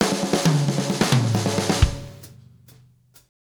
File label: Fills, Monster Beats; Zero-G